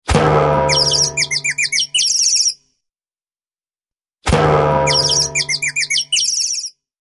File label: Cartoon Hit Alert